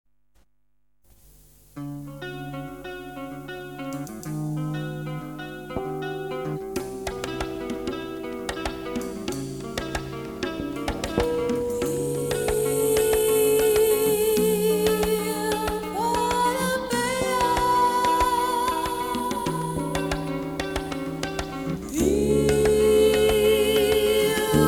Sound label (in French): Bossa